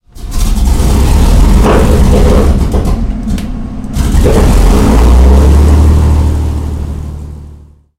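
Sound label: engine start cockpit left; lancaster; War Thunder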